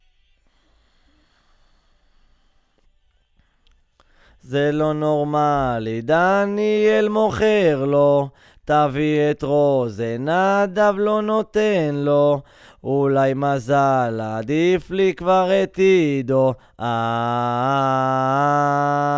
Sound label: VOC GUIDE